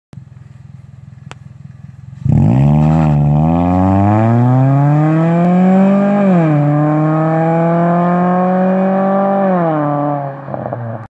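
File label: Forte Koup, اگزوز; سراتو کوپه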